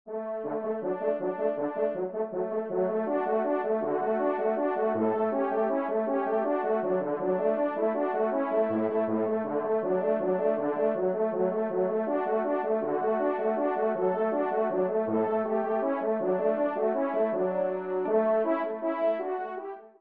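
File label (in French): duo, ensemble, trompe cors; Kling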